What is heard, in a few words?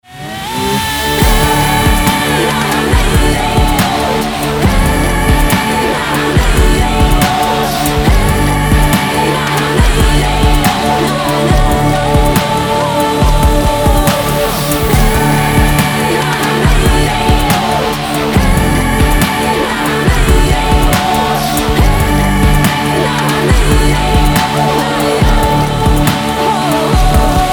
ELECTRONIC II